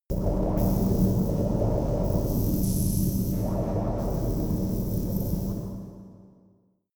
space inside biomedical alien power plant

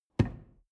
drawer medium close lock

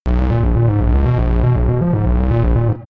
game arcade underworld

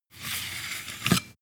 sword scabbard handle pull

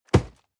box hit hard rustle

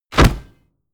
basketball hit basket

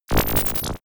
energized blob

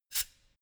pneumatic pump soft short

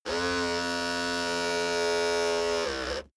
servo steady long

